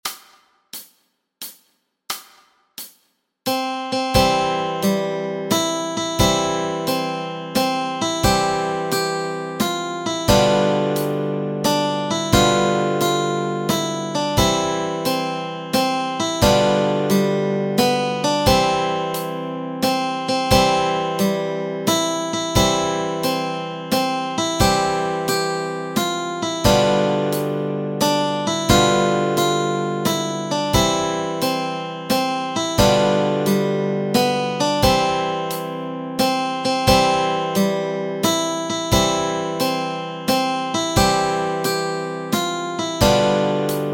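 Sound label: melody and chords, Easy Guitar and Ukulele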